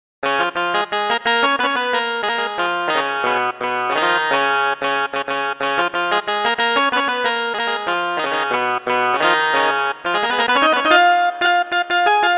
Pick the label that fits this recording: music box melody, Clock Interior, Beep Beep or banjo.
banjo